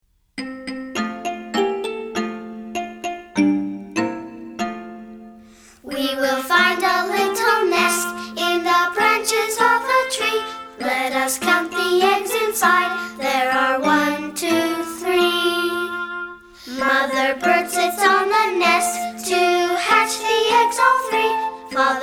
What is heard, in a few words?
words and music